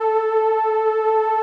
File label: SOLINA, String Machines